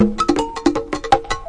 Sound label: 161 percusso swing, drum loop; 161 to 180 bpm